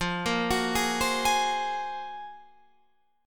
Gb Major Sharp 11th F

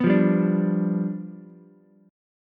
G 7th G down Ukulele; G7 strummed; JGuitar

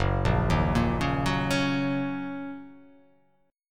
Fsharp 6th down, chord

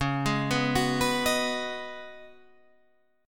C sharp 9th Suspended 4th, down, Guitar Standard; arpeggiated; chord

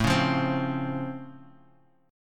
Db Augmented Major 7th, A

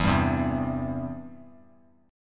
E Minor Sharp 5th E down; Em#5 strummed; JGuitar

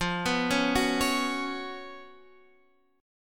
B Suspended 2nd, F, chord; arpeggiated

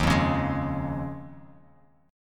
Eb 7th Suspended 2nd Suspended 4th, Bass Standard; strummed; chord

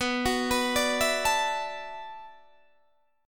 B Minor 11th B down Guitar DADGAD; arpeggiated

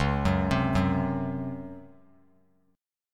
Db Major 7th Suspended 4th Db down; arpeggiated; JGuitar

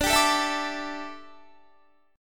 D Suspended 2nd Suspended 4th D down Guitar

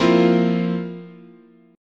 F Major 7th Suspended 4th, chord

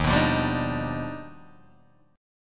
Eb Augmented Major 9th Eb down Guitar Open D

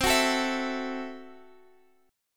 C 6th Flat 5th, C, down, Guitar; C6b5; strummed; 4E,4A,5D,5G,5C,6F

